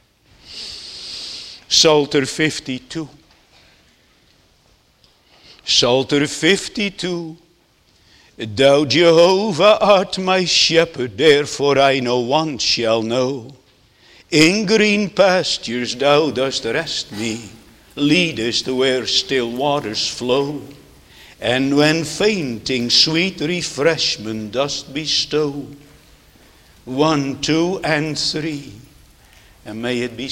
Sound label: Singing